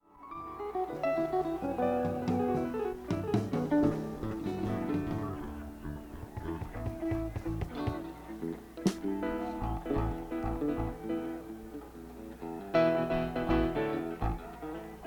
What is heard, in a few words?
Tuning